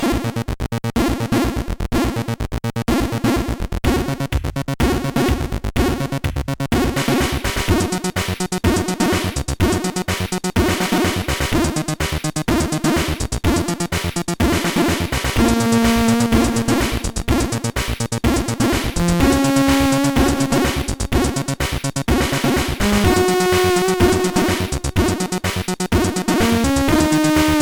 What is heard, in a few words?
jingle; just a jingle; Synthesis